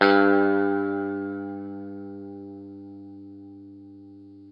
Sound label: Guitar & Bass; 90 s Sample CDs; Quantum Leap - Guitar & Bass